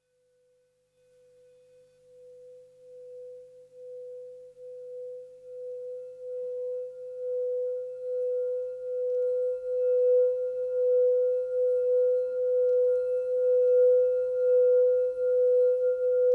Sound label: singing bowls; Yoga and sound; CRYSTAL BOWLS & MEDITATION MUSIC; WORLD MUSIC